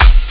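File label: bass drum; monk; ST-XX Amiga Soundtracker Sample Packs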